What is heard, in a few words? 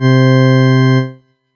Korg DW 8000 Pipe Organ C3